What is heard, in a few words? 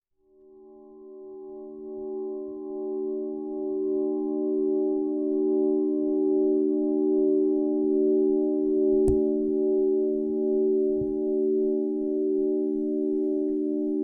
Harmonised Healing Set, crystal singing bowls